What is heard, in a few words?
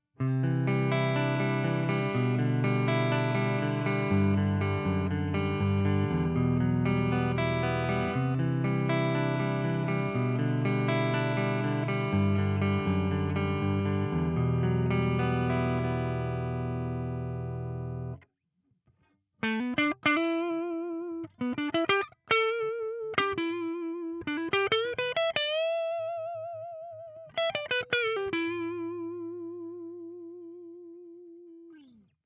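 Gibson Les Paul clean neck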